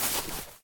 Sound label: moss step